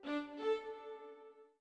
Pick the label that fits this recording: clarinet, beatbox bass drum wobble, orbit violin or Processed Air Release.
orbit violin